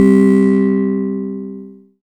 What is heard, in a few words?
SYN BROKEN, SYN MicroWave; Sound & Vision - Gigapack I CD 2